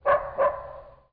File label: Wargs